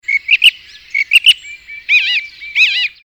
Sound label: Song thrush